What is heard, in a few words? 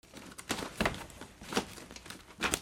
OFFICE